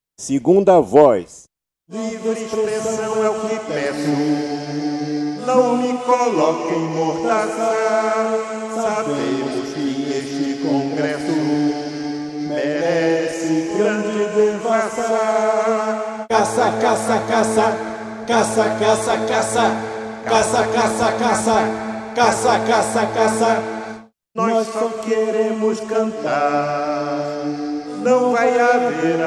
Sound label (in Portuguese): cassa cassa 2a voz, coro do povo